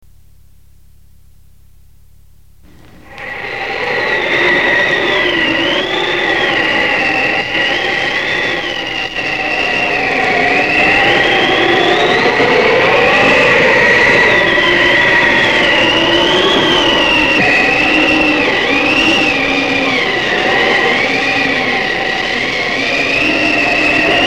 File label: Snowstorm